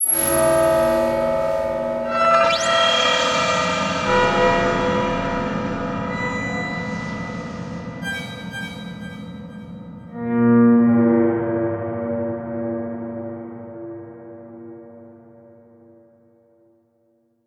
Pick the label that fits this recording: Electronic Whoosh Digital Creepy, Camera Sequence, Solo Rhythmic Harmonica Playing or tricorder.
Electronic Whoosh Digital Creepy